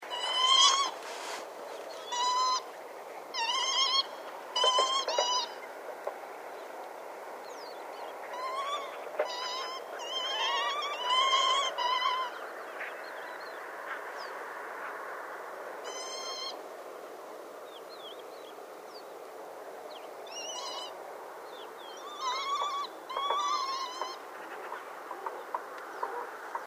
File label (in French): vanneau huppe